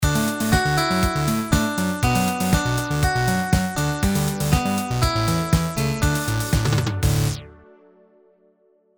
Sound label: jingle